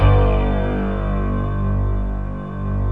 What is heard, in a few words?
ANALOG STRING; OMI - Universe of Sounds